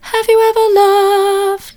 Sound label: vocal, soft